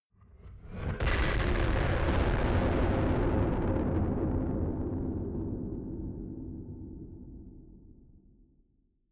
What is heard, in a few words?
distorted blasts